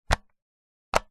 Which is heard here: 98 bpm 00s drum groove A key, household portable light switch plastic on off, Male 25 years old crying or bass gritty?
household portable light switch plastic on off